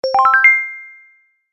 kirarin

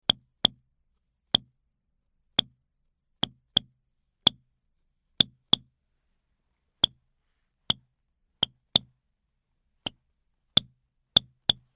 pikopiko hammer

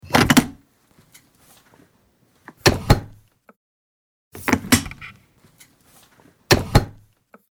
door

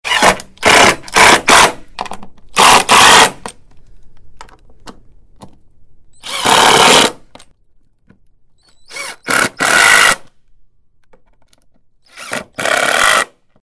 electric driver

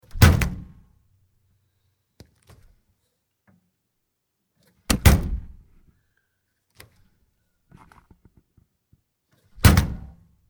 door

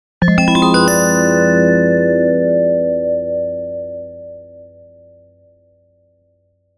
JINGLE fuan

